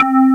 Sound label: lead; Stereo